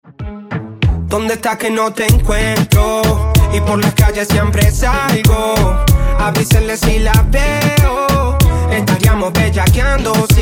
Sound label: Reggaeton Beats